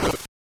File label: wing